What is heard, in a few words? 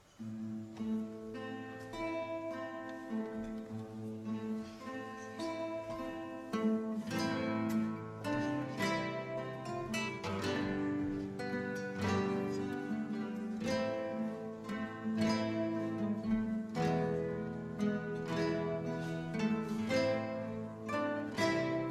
Guitar Orchestra; Greensleeves